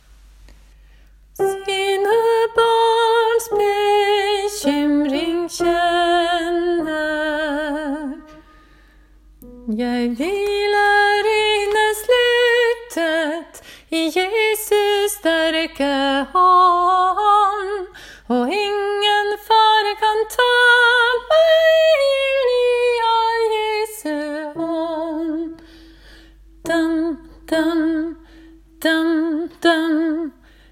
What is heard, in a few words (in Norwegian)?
sopran; ingen-er-sa-trygg midtdel; åfjord